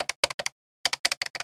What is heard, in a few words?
pushing phone buttons